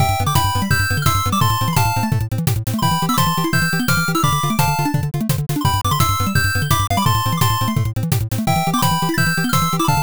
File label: bgm drive